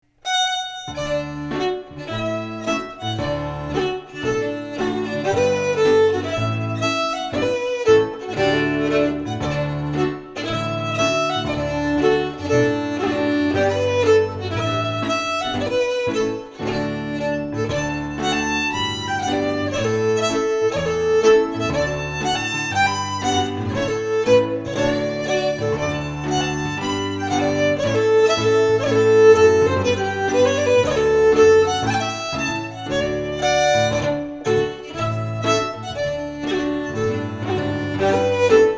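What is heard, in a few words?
Strathspey, Scottish Fiddling; Macglashan col 1796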